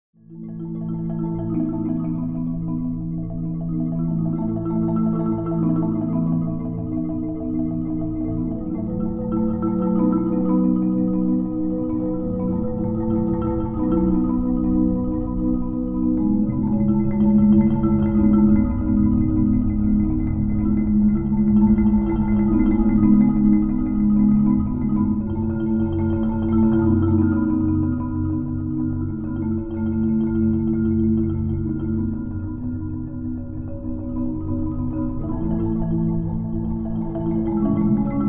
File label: Marimba Waves